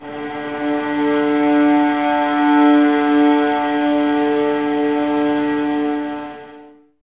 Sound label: STR VAS D, Violas, No Vib; Miroslav Vitous